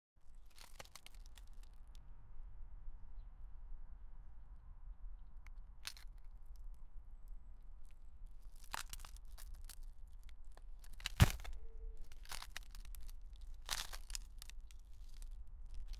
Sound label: building destroying becau, natural disasters